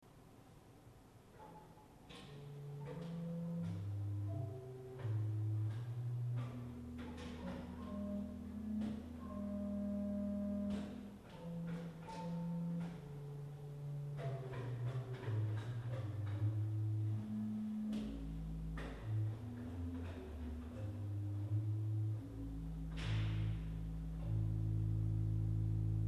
Quint 10 P, Orgelstimmen

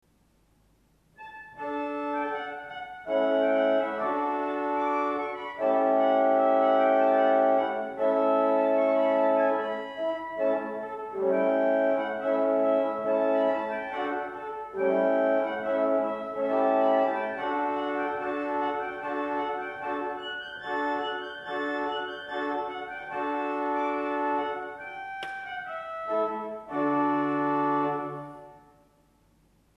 Oct 4, Orgelstimmen

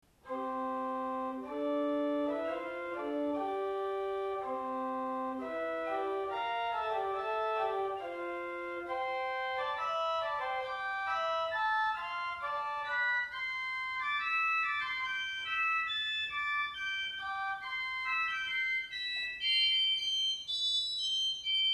Mixt 3f, Orgelstimmen

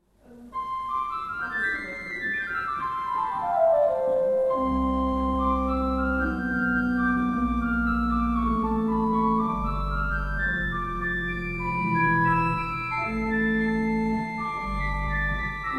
Travers floet 4

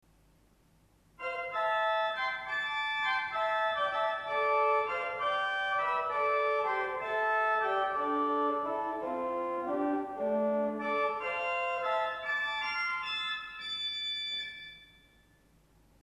Mixt 2 4f HW, Orgelstimmen

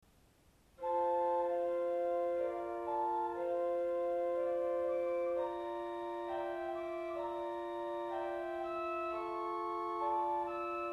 Fl dolce 4, Orgelstimmen

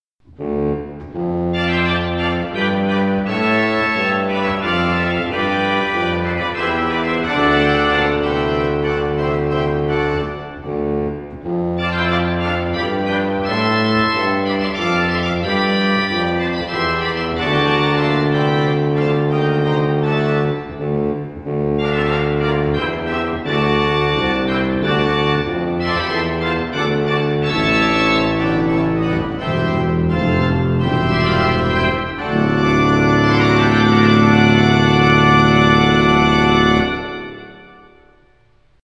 Posaune 16 Ped Sauerorgel; Ronsdorf

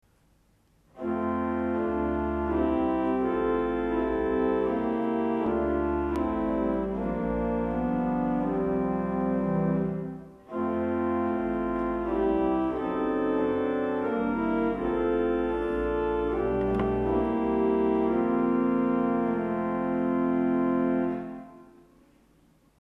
Streich 3, Orgelstimmen

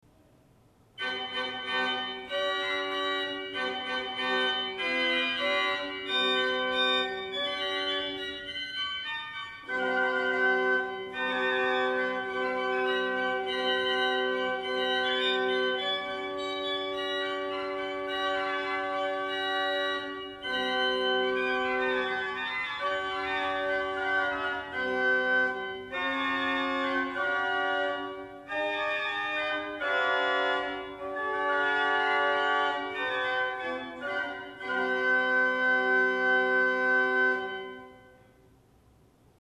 Mixt 3f HW, Orgelstimmen

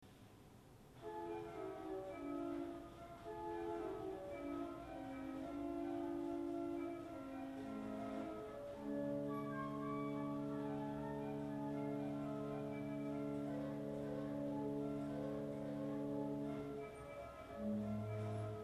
Harmfloet 8, Orgelstimmen

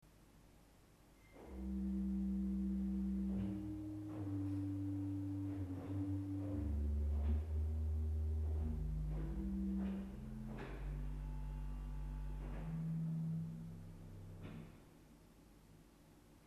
Subb 16 P, Orgelstimmen

